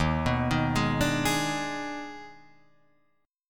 D sharp Major 7th Suspended 4th Sharp 5th D sharp Guitar Drop D, chord; chordshape; 1,2,1,1,3,4